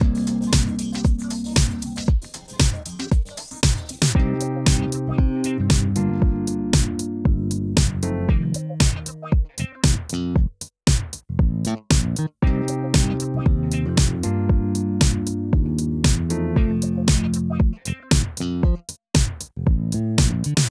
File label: Pickem Music Loop; playboy Gold Desktop; Mahi Gaming